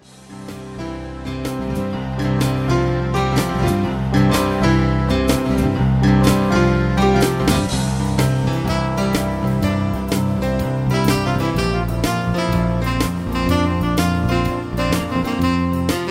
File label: Sax Lead